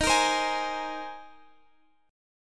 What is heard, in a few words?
A sharp 7th Suspended 2nd Suspended 4th